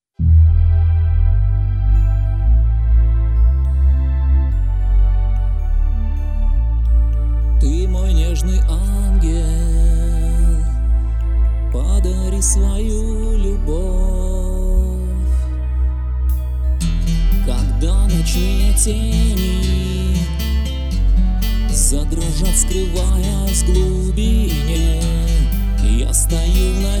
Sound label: Rock